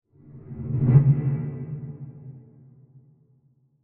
Deep Fast Moving Transition; Scary Soundscapes; Horror; Crafted Signals

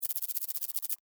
Insect, Movement, Shake, Bug; Sound Effects:Animals:Insects; Blastwave

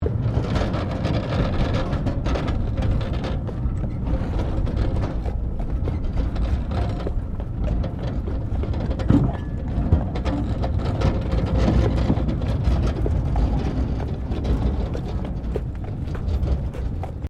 Tram Horse Drawn Carriage Rolling; Horse drawn tramcar; Carriages; Vehicles

